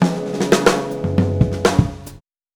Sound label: jingle